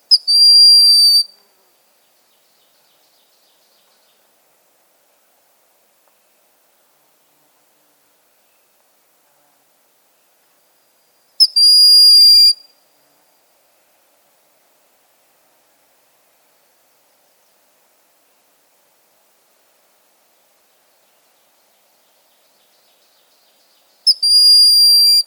Broad winged Hawk

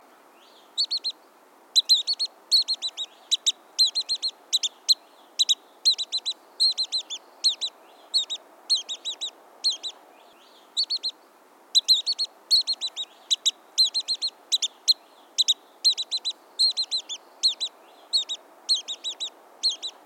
Pygmy Nuthatch

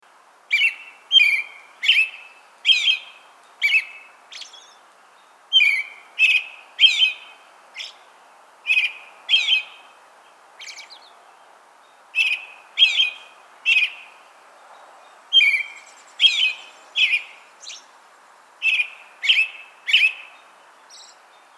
American Robin Turdus migratorius

